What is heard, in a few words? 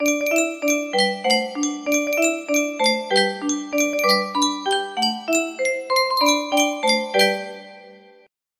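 happy birthday song, music box melody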